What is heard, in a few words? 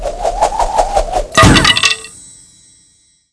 NEW YEAR